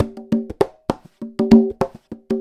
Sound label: Conga Loop (100BPM); musicradar